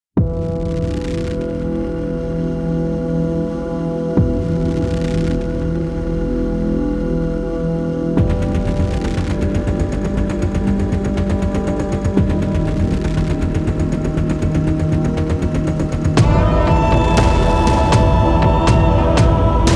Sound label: instrumental